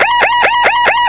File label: attack helicopter damaged alarm; video game; npc; Source Engine